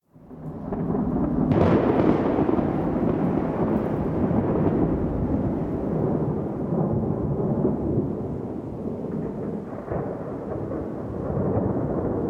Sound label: Exterior Rolling Thunder; Warframe; Ambience; Duviri